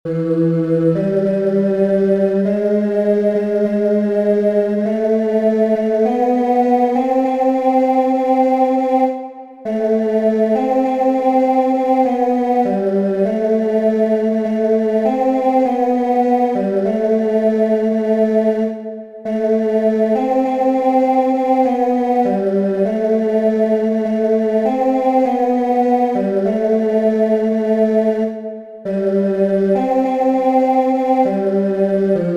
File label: TENOR